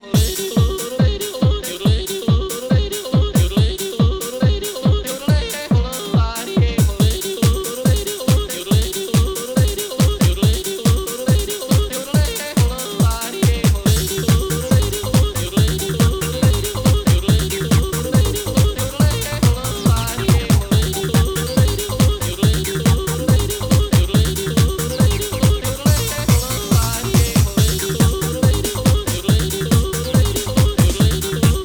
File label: Tirolian Groove